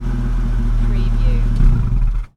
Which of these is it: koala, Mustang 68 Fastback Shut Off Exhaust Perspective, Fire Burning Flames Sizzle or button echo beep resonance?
Mustang 68 Fastback Shut Off Exhaust Perspective